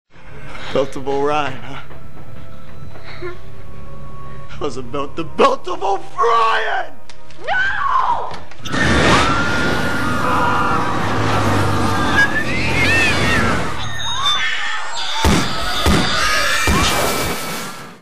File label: belt